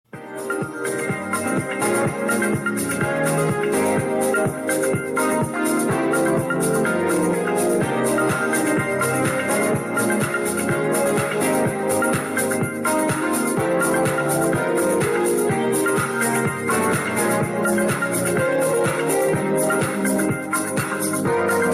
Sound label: Dance Party; Samsung